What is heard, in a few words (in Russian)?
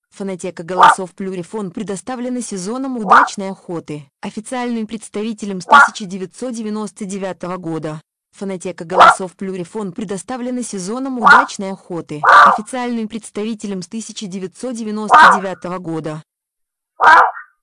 лисовин; plurifon